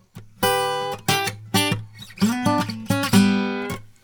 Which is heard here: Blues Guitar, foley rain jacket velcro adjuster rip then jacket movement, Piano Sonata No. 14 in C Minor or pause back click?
Blues Guitar